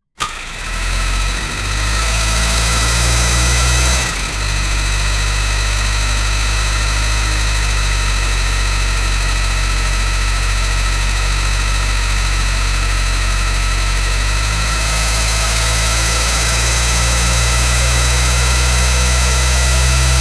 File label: v4 999cc two stroke 300, engines